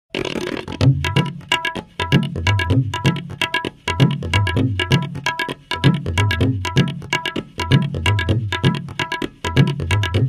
table loop

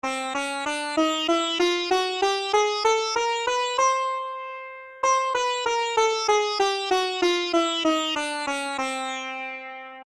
Chromatic Scale, Virtual Sitar; Syntheway

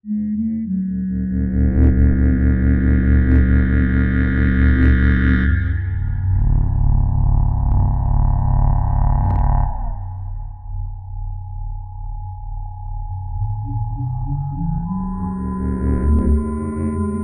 ATMO Ethereal Cosmos; SpheratorFM

